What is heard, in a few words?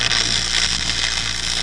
bzzt